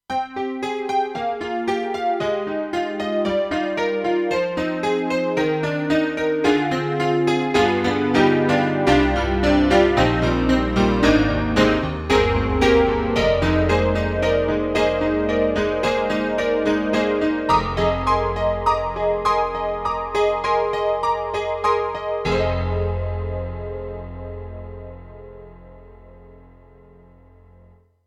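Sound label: Honky Tonk, piano; KORG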